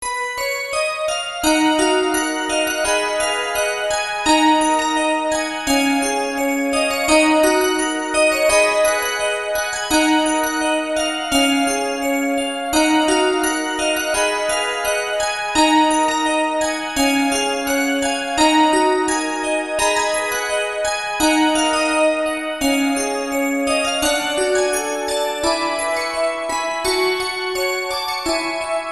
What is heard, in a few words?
piano